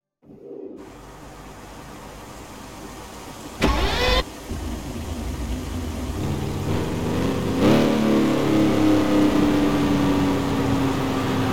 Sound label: porsche 911 turbo 993; 1995; TDU-1